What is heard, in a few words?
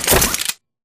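Tick Movement Footstep Jump; Titanfall 2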